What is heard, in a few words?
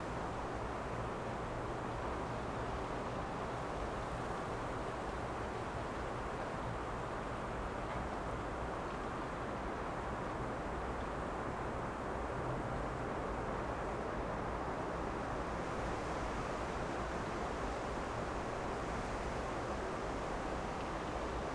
low wind; gmod; garrysmod; airfield